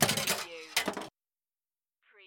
Pinball sound, ball launched, rolls and comes to stop